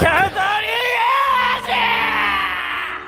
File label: scream; itadori yuji